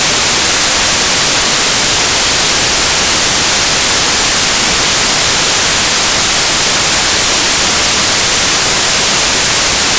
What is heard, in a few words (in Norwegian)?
Hvit stoy; oresuslyder